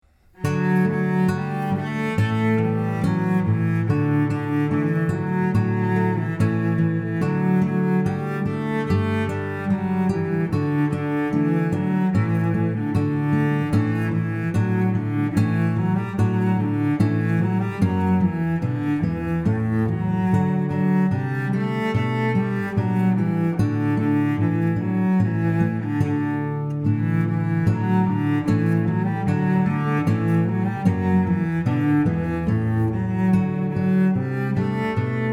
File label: The Fevers Guitar And Cello Duo; Ode to Joy